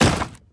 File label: Scenario Wood Break